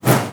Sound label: BOW PERC, BASS FINGER; Xsample VOL-5